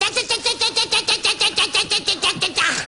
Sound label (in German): Maschinengewehr; Die Dinos